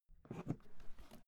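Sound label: briefcase open soft, Büroarbeit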